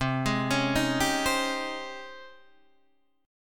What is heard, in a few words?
G sharp Major 11th C